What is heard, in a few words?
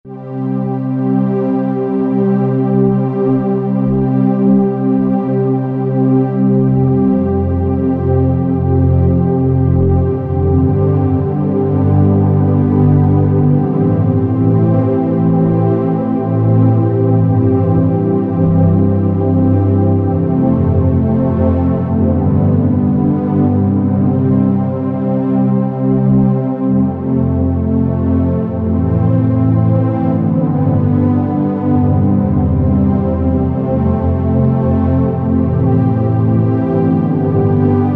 Underscore PAD; Worship Flow